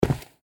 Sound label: player walk stone loop; footsteps